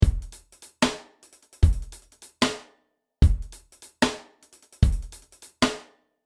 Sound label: RnB 75